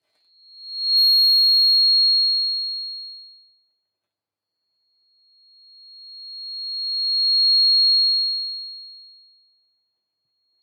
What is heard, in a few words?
Microphone Feedback Dry